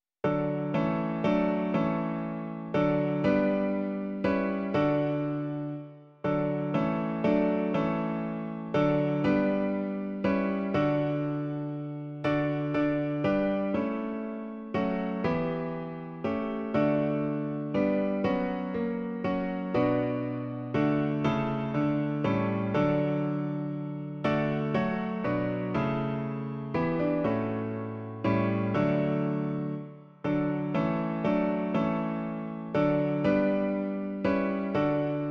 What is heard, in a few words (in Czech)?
Tvé lásky nehasnoucí zář - klavír; Klavírní verze